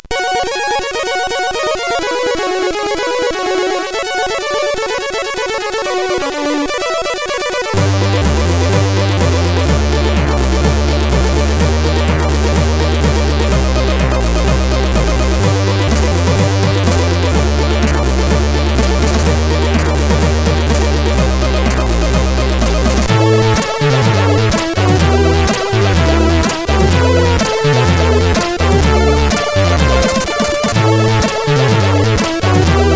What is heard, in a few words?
Game Sound, CSG8580R5; Game Sound 3; hvsc